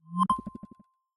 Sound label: Arrow, notifications; Galaxy